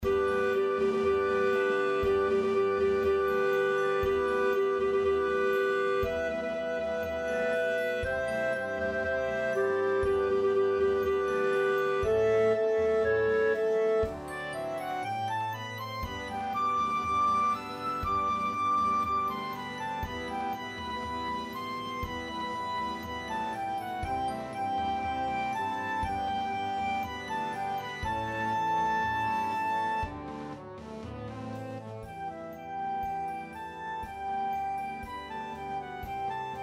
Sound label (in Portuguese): clarinete bb